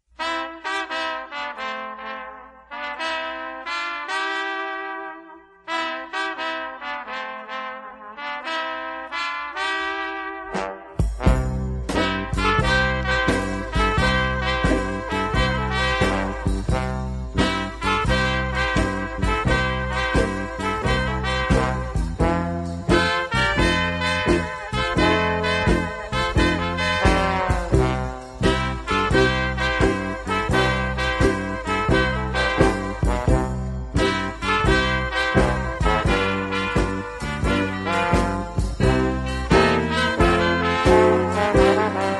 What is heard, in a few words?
rhythm blues; SkatingSchool